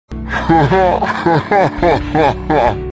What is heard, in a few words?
laugh